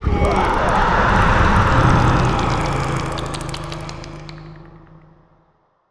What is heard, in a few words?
Distant Absctract Scream, Monster Sounds; TheDeclineOfWarriors; Monster Sounds Volume II